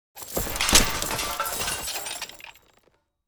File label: Medieval armor and leather uniform dropping